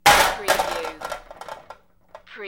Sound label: Metal Medium Impact Settle